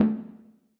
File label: Conga Low Natural, 808 Congas; Slate; Vintage Drum Machines Volume 2 808 Edition